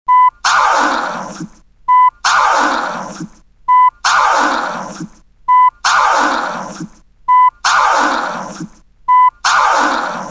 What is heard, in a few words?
scratch